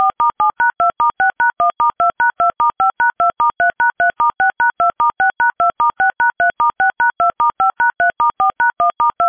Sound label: dial; Dial Trial; HackyEaster 2018; mp3 file played by the app when hitting the button; DTMF tones